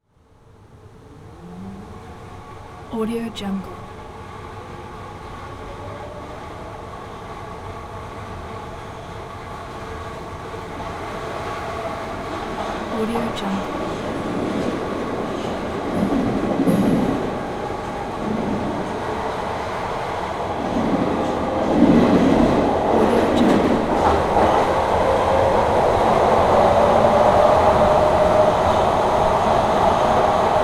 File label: metro redline